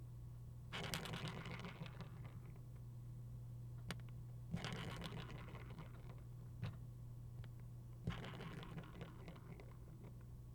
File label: Rolling